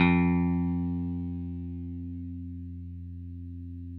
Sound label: 6STR BASS, Country; Sampleheads; Whole Lotta Country 1 & 2